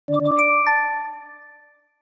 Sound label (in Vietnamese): nhac chuong thong bao line mac dinh